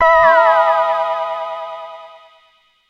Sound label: stinger fail